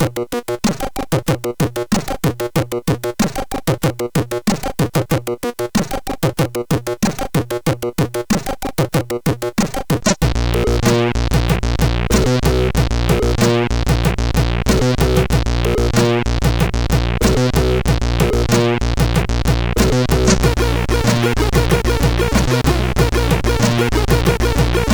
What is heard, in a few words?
sid CSG8580; Plov Fripperies 1; hvsc